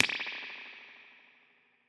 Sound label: Combo Spring Bright, Impulse Responses, Spring Reverbs; Medium Spaces; Apple